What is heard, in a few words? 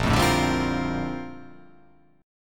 A sharp Major 13th A sharp